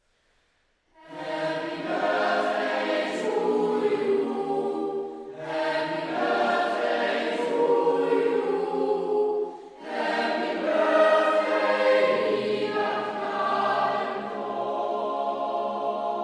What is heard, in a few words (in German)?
happy birthday knabenchor